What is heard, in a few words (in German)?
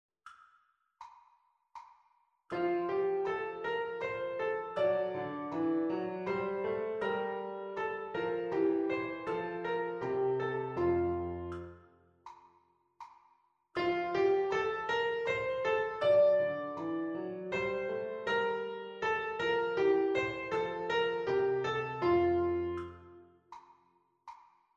Zweistimmiges Diktat F-Dur; Unterricht